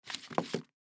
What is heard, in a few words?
Flip Flops Pick Up; Shoes (Sound Effects:Objects); Blastwave; Foley